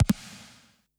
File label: Notifications and Buttons